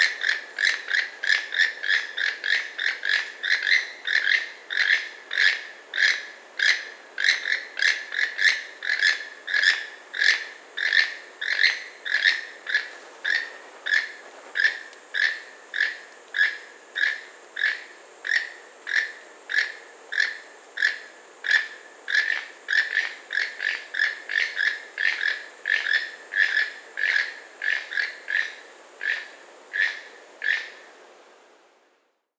Keel billed Toucan Ramphastos sulfuratus brevicarinatus